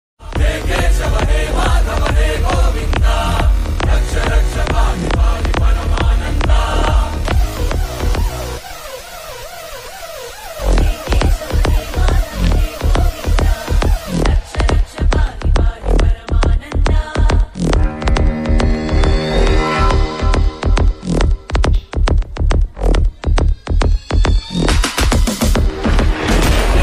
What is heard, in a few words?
karthikeya bgm telugu ringtones